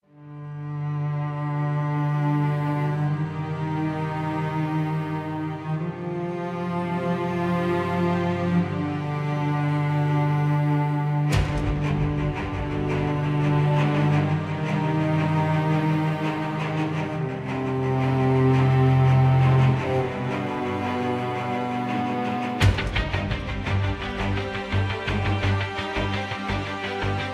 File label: Orchestral; Media Composer